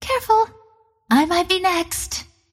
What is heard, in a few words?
deny pain; Windrunner